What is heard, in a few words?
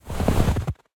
snow footsteps walk light; sonniss gdc